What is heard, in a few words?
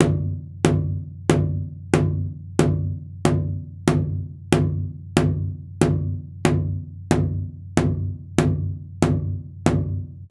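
Big Drum felt mallet; Nightfall (Tabbyewik)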